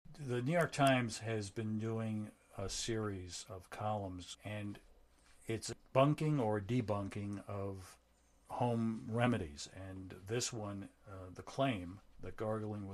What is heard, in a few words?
salt gargle